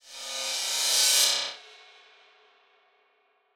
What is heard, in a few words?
Reverse Fx; musicradar